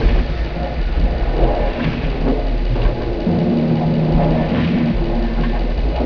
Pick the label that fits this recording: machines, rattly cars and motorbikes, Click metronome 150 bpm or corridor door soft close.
machines